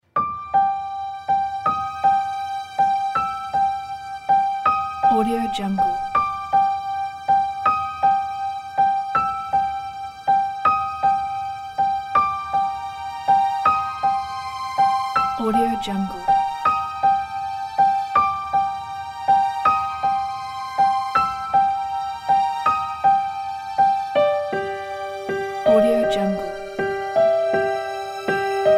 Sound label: piano suspense music; eitan-epstein-music